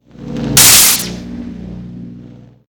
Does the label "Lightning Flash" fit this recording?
Yes